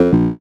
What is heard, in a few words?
Incorrect answer, notification